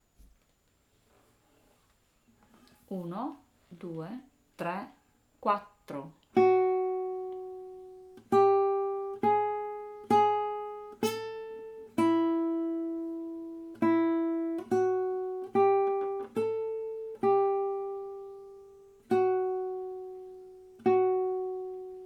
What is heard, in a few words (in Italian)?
Chitarra, Vento